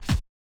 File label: Yamaha DJX 2B, Scratch F